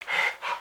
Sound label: idle, creatures organic